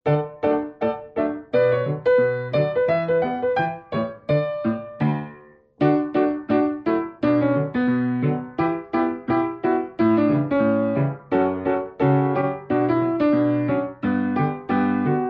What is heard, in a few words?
lightning likes me instrumental